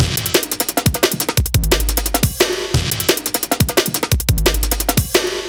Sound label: 175, classic dnb breaks